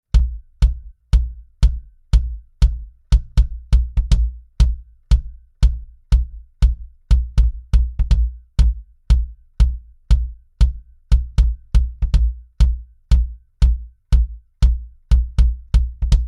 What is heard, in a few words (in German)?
BD Variation ohne Transformator; UnderToneAudio MPEQ-1